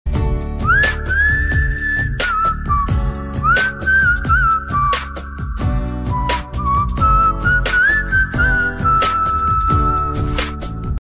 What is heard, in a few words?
song whistle bgm ringtone; virataparvam; sai pallavi